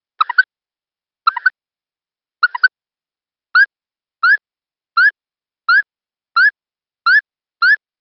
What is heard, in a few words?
Leptodactylus bufonius; amphibia